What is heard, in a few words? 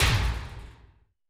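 Rattle Boom, Alesis D4; Efx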